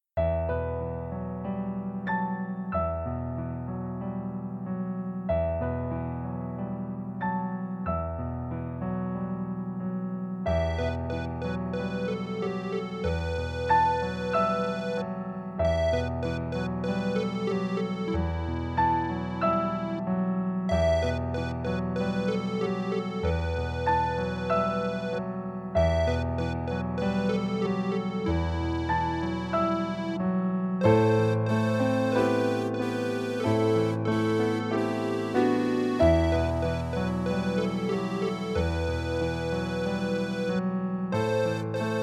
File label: Piano Parts